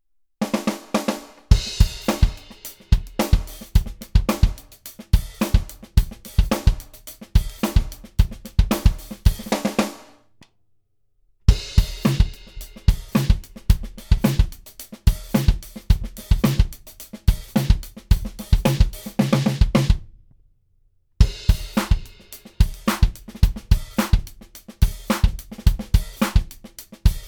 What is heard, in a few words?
Roland RT Mic